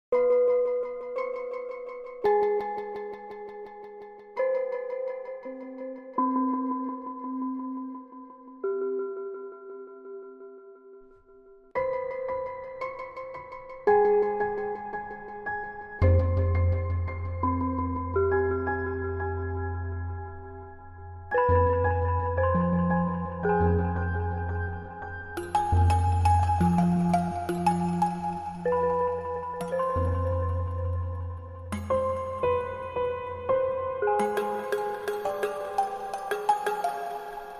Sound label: Main Motif Only